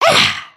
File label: drink